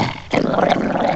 mongrel, animals; M8; CMI Factory

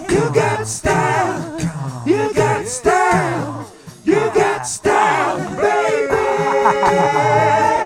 VOX R, Roots Disco; USB Soundscan vol.32; AKAI

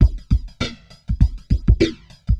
drloop, drumloops, Vinyl Attack; Masterbits

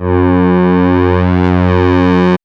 MOOG; AMG - Vince Clarke Lucky Bastard

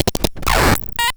Glitch Rhythm, Drum Machines; Cwejman S1; AFG Prikle; 300 Drum Machines; DevSnd Cwejman S1 - JK

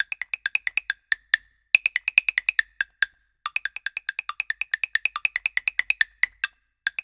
percs 140 bpm; INIS - Opium

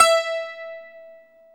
TZOURAS E 4; Soundscan vol.53; Mediteranean Traditions; AKAI

